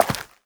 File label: step, footsteps, player, dirt